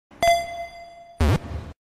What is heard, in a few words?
catching attempt sound effect; pokemon red blue yellow